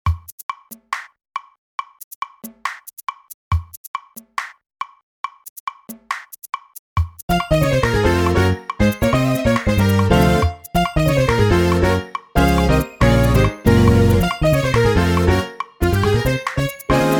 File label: drumless